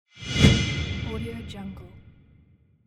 metallic whoosh

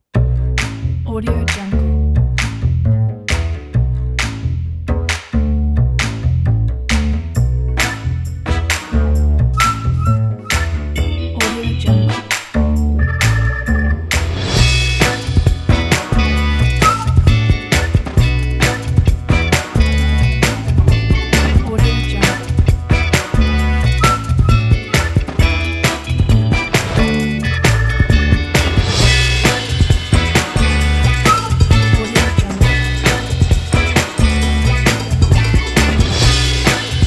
funk; allfinemusic

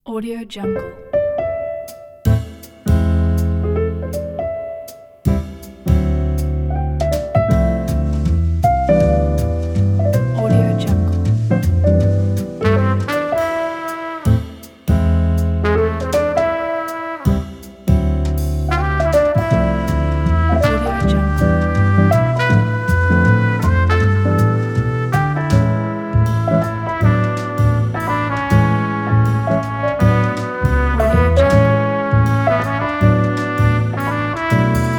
jazz

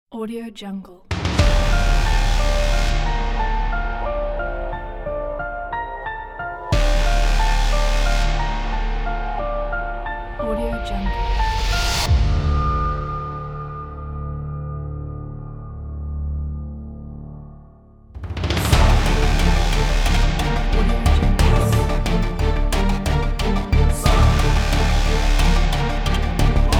hybrid dramatic trailer

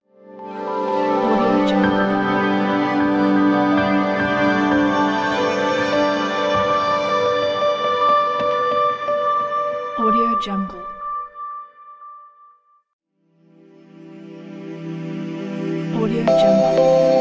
atmospheric relaxing endless ident pack

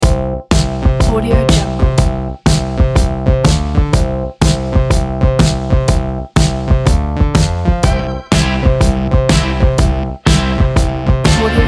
the energetic indie rock

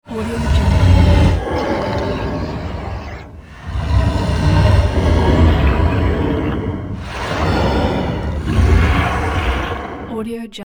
long gurgling dragon breaths

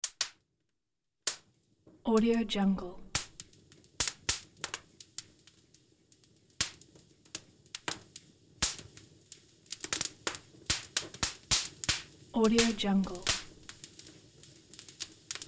fire cracks firewood

